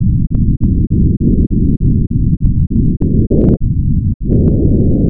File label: bandedge low; Pitch; Melodia